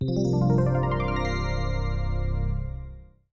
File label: Epiano, start up melodies; Milestone 312